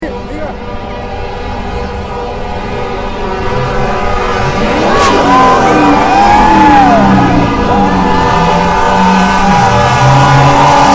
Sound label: VROOOOM; MARCH FOR IMMIGRANT RIGHTS: LOS ANGELES; Los Angeles Independent Media Center